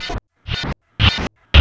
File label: MACHINE